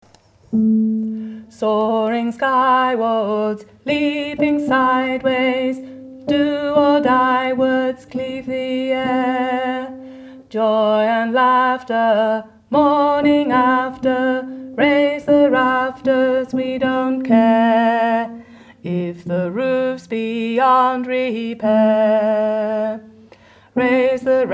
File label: Tenors; Unison in Harmony